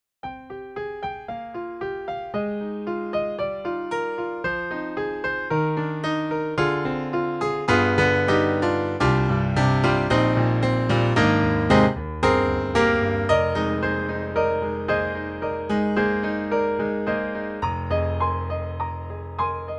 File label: acoustic grand piano; IK Multimedia